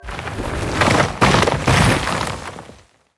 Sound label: Magic house Transform; Level14